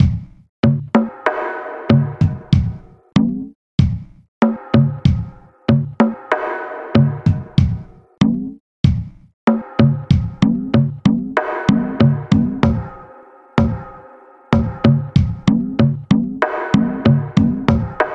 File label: Electrical Drums; gamerip; The Undergarden; xbla xbox 360